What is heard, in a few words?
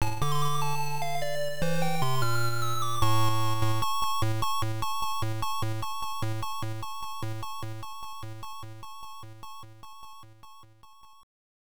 Scene Clear; Rastan Saga; MSX; Taito